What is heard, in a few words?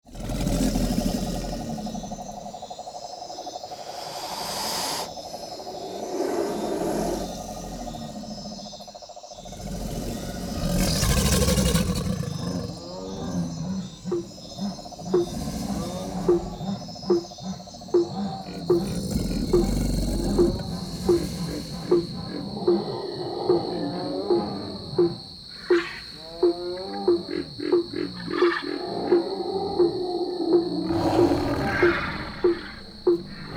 animals sound fx